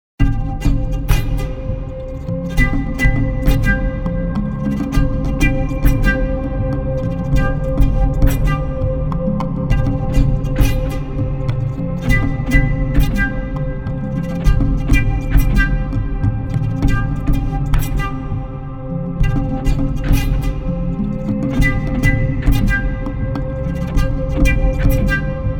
oriental drone

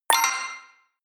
notification signal

